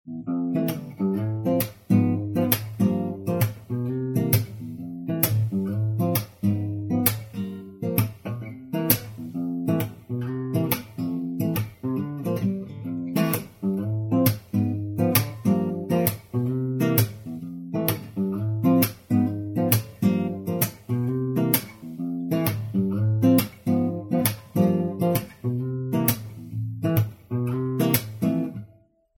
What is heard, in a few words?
jam